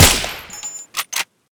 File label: M700